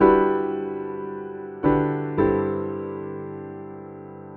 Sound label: Ac Piano 110 G, jazz keys, Chordy Loops, 110bpm